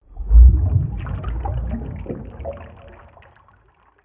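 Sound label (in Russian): пузыри водяные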